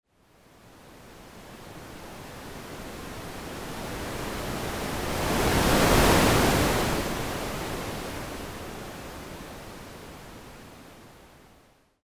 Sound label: Loudness sweep up down; A Description of Sound and Its Qualities